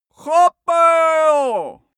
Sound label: male shout arabian